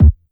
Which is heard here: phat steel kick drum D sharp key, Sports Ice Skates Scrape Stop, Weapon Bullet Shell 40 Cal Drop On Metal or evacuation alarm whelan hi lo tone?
phat steel kick drum D sharp key